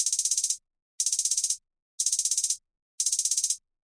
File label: trap hat trills, drum loops and breaks; 120 bpm